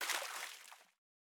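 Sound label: Splash Small; Boomerang Fu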